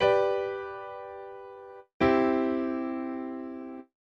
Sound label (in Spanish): Cadencia Perfecta Do M